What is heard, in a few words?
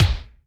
kik Trendy, Alesis D4, Drum Machines